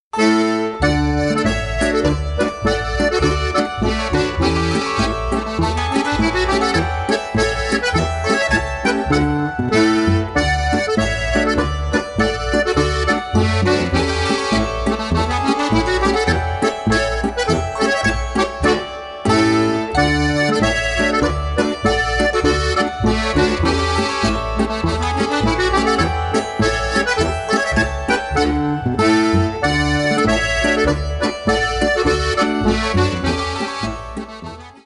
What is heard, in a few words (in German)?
Harmonika